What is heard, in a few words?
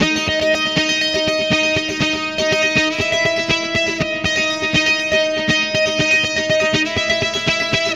guitar, stringed instruments